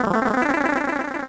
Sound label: Power Up Smoke; SMA4